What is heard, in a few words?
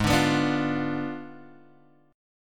Ab Diminished 7th Ab, Guitar, Open F; Abdim7; strummed